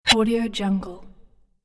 infographic message removed

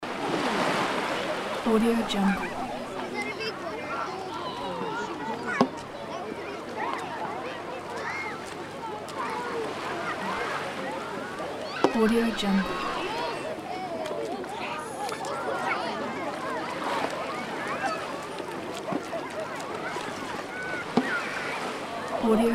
seaside ambience loop

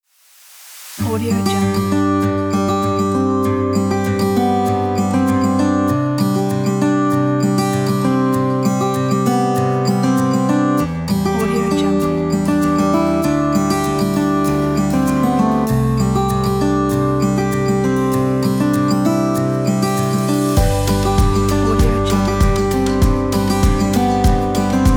peaceful folk

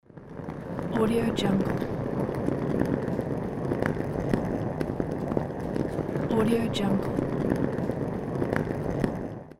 rolling suitcase

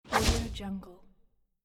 swirl fire whoosh; audioheart

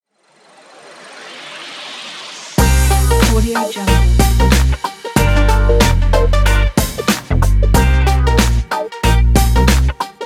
advertisement background in groove; raysoundmusic

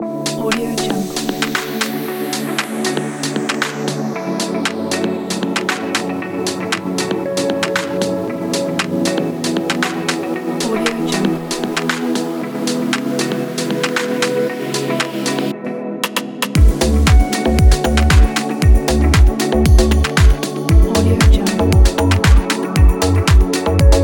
deep house summer night

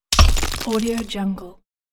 breaking brick wall

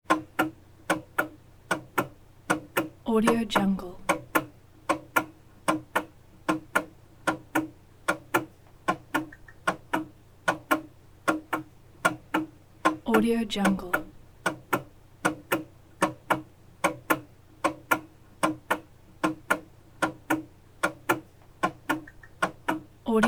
wall clock constant rhythmic ticking

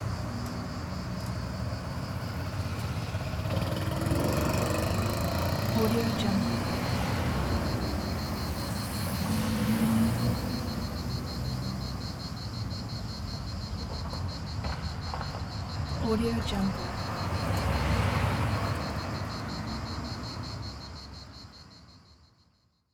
village traffic

